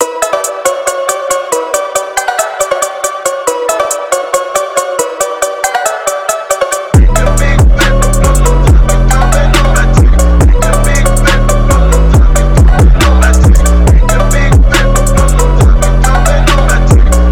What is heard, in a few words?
BASS PHONK